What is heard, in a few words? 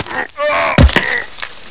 snark